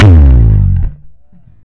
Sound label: FUZZ SL, Bass Legends, SLIDES